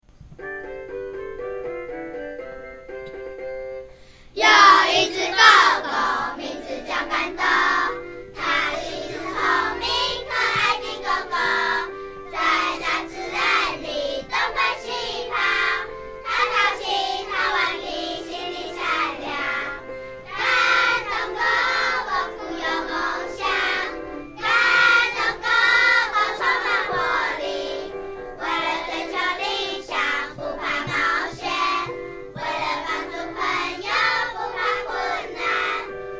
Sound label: Singing Moving dog by students